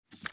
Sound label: card deal; Blackjack lucky